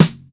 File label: rich top end snare single hit D# key; snare drum samples